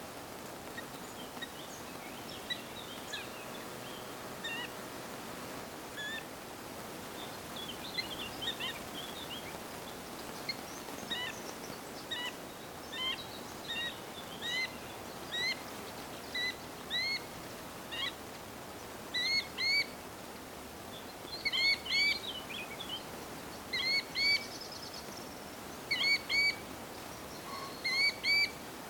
Dodaars Tachybaptus ruficollis

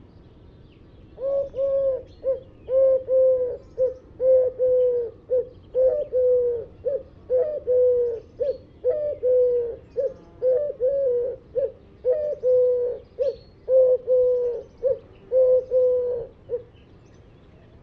Turkse Tortel Streptopelia decaocto

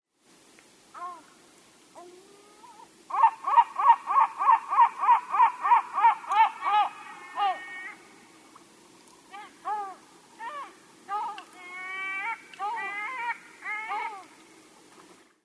Geelpootmeeuw Larus michahellis

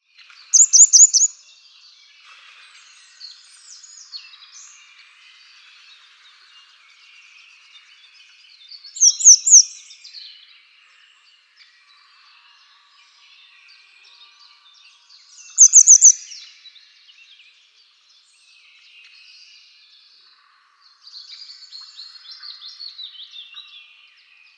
Grote Gele Kwikstaart Motacilla cinerea cinerea

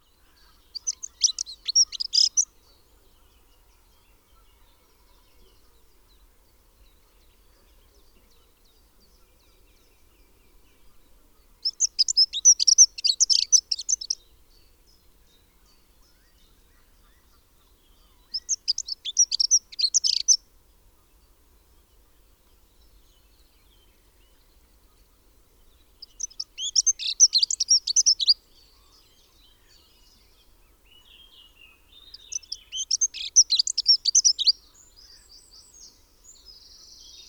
Heggenmus Prunella modularis

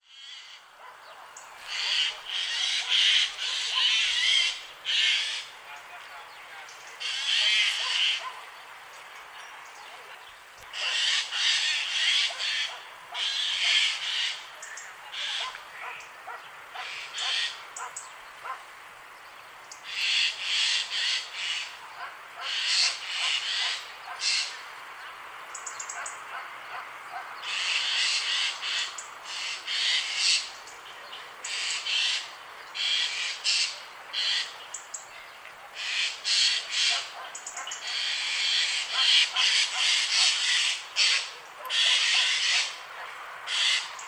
Gaai Garrulus glandarius

